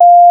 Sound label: dash; openFrameworks